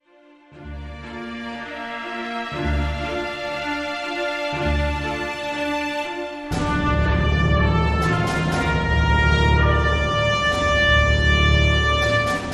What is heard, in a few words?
No Choir